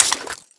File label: mag out, pm; svencoop; ins2